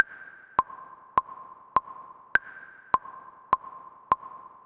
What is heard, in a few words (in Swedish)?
metronom